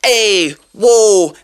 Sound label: ay woah, voice ooh ahh